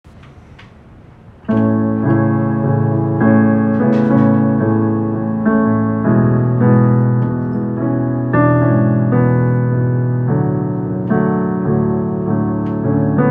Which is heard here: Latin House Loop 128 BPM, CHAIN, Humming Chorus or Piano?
Piano